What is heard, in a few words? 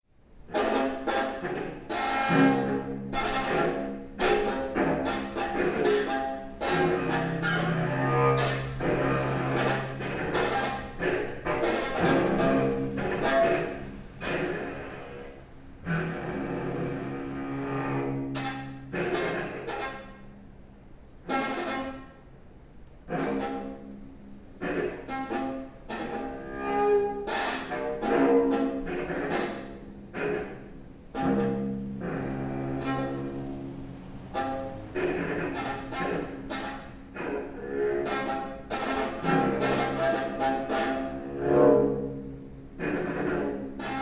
Duo for Cello and Viola; Dedicated to Antonio Agri